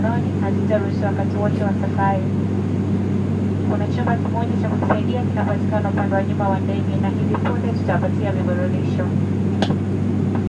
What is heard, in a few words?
Music and Traffic in Car: Arusha, Tanzania; This was the day before my safari